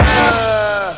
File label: AUHG